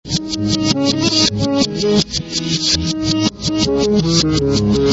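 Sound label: Guitar reverse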